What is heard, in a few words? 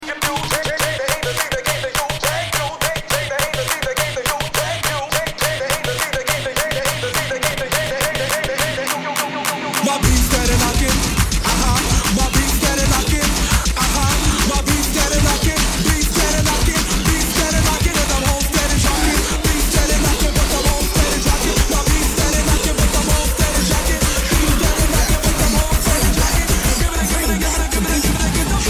beats